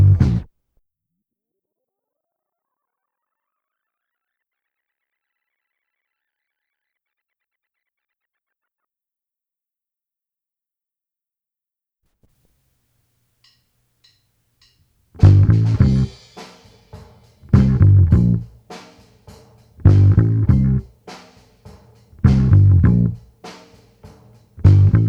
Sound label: bass